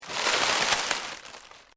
LEAVES DEAD MOVE, Rustling; Objects

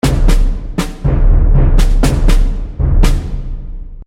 War Drums Loop; Musical Sound Effects

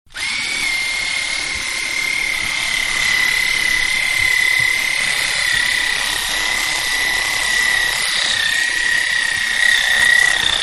Brush Teeth Electric; Brushing (Sound Effects:Human); Manhattan Production Music

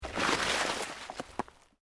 Underbrush Dead Move, Rustling; Material components for constructing more complex sounds; Objects

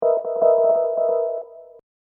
Hip Hop Dark Rhodes Licks 90 Bpm Swing E; Royalty Free Keyboard Loops

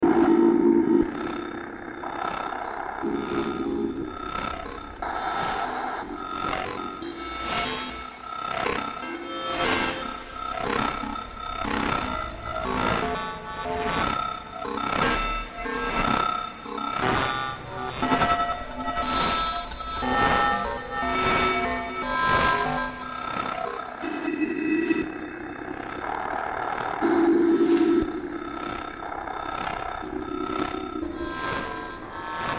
Sci Fi Bed Distorted Loop

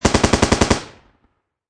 Machine Gun M240B Long Burst Close Perspective

